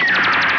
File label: ZAPPEROO; HIGHTECH